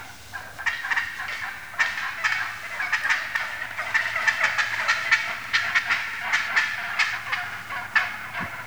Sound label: cutting, turkey; hunting